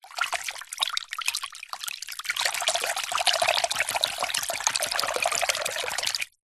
foley liquid hand aggitate movements water in plastic container shallow